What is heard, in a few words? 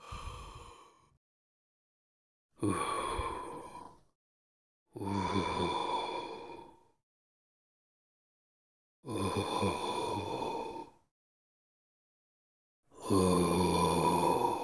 breathe loop; Hollow Knight: Silksong